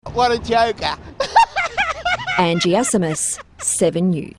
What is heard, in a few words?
LAUGH